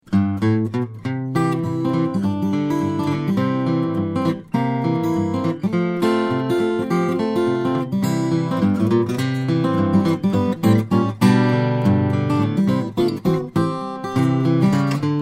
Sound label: l-00 custom, steel string guitar flattop; char